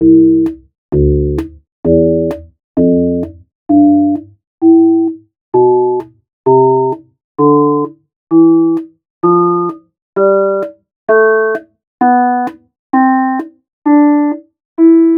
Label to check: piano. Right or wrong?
Right